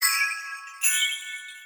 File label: recall bell